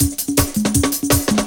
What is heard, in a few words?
Positive 162, breaks